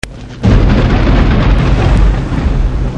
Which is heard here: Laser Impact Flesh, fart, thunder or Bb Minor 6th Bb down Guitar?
thunder